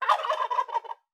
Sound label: poultry turkey; soundbits-soundfx